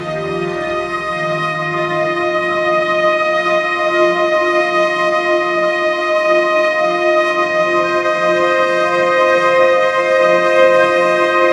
elegant cinematic strings and piano

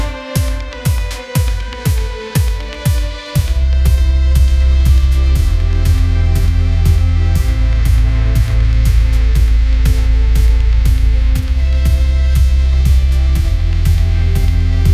epic pop anthem rising, ringtones

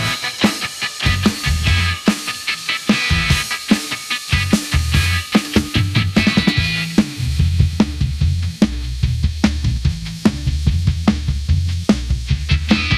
power play riff ringtone

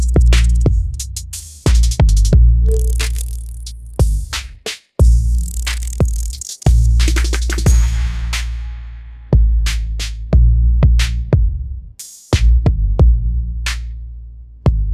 shadow bass trap beat

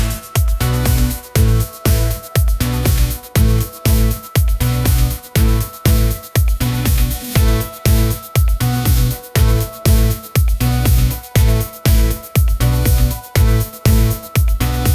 pop groove; ai-generated

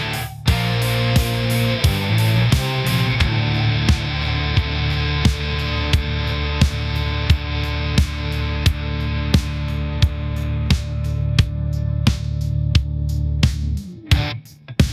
adrenaline highway rock, ringtones

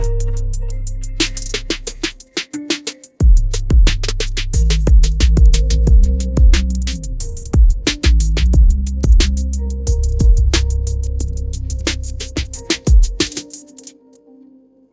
dark melodic trap groove; ai

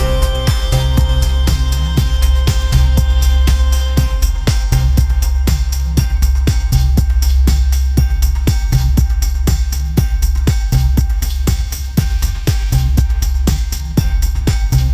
upbeat pop melody, ringtones; ai-generated

